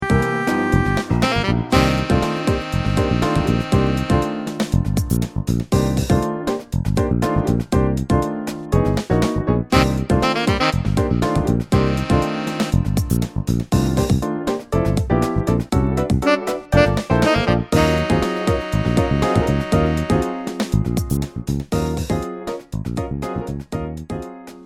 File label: Jazz Funk with fade